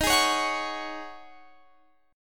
C sharp Suspended 2nd Suspended 4th D sharp, chord